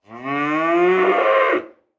cattle; fauna

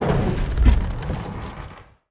Gates; 0AD

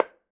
sling wood, attack impact

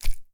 flesh impact

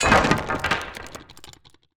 ballista hit siege; ships siege

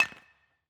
mine stone

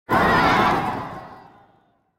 alarm attack city; interface

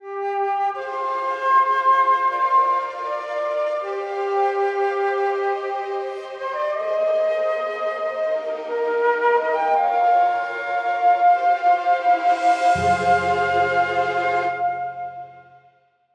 Outro

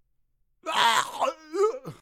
male death

